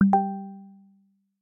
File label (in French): Tonalités